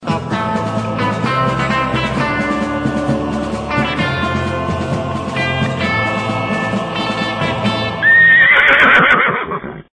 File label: western horse whinny; MELODIC SAMPLES; bonanza